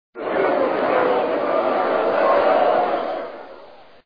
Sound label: Voices Crowd Cheer; Portal Fighter II